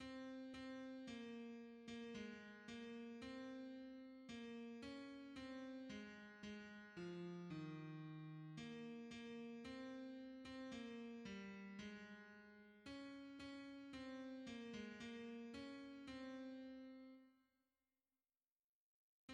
hymns, tenor

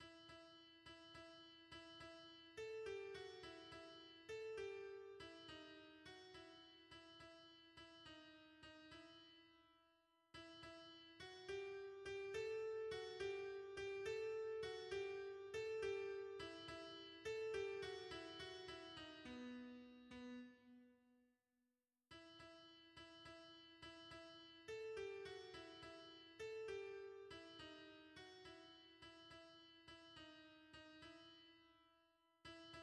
alto, hymns; O jour d angoisse

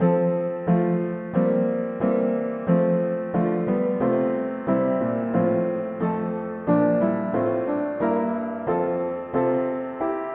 mono-piano, hymns; Lord we treasure with affection

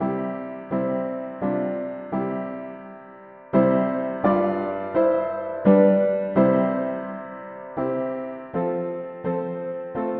mono piano, hymns; Lieu de repos

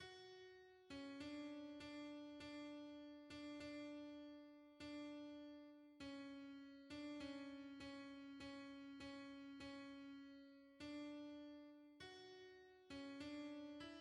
hymns, alto; Father words of mercy

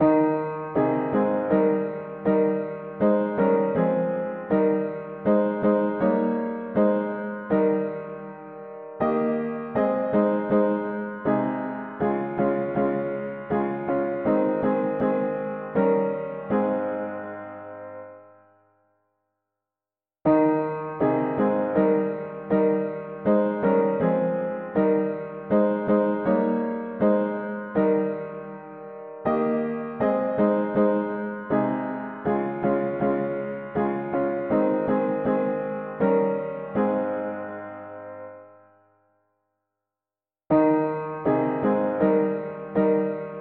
mono piano; hymns; The Prince of life

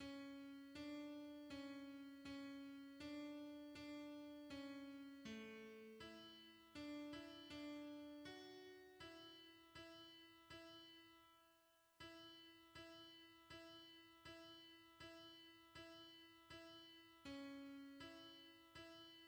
hymns, alto; Jesus Lord Almighty Saviour

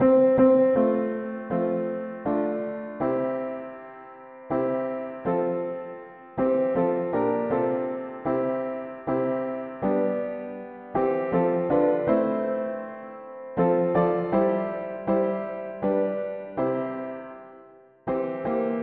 mono piano, hymns; Pour le coeur travaille

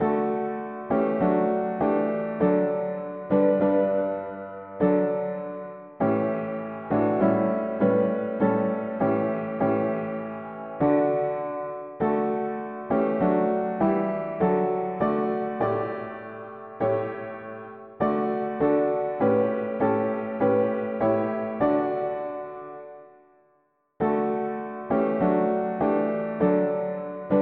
mono piano, hymns; Father words of mercy

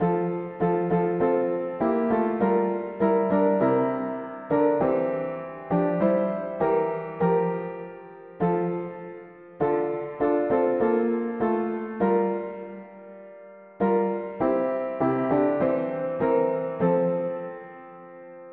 mono piano; hymns

